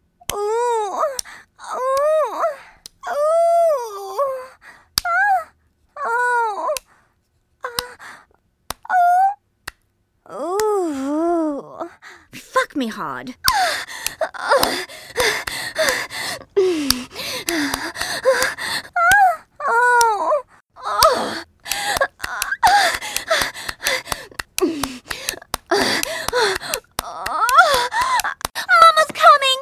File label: moans